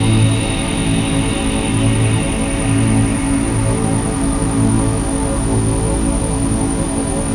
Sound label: undertones, Synths; Notes